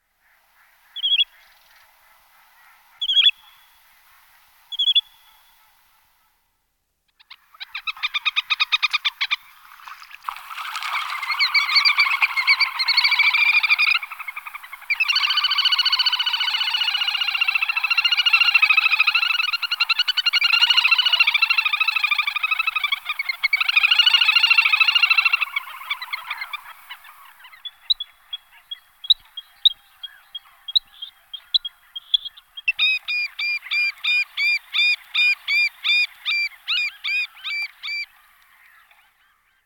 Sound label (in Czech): Potapka mala Tachybaptus ruficollis